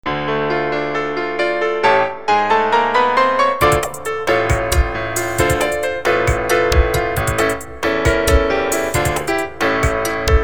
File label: Commercial Lounge